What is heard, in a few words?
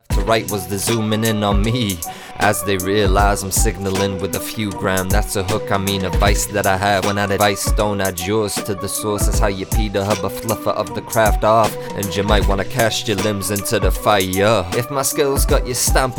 vocal raw; vocalforge; whitepeak creative